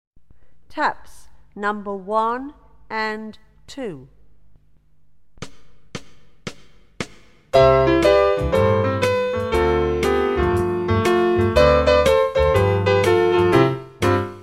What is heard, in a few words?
primary tap taps hops springs